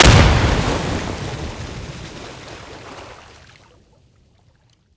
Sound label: det, wpn gren